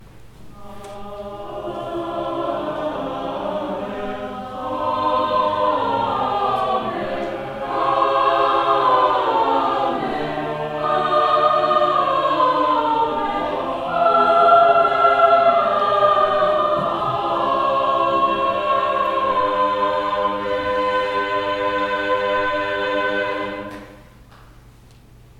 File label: Amen